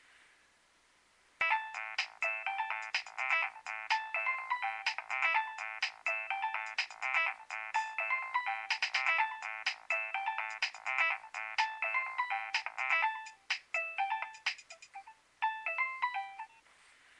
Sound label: nokia3100 ringtone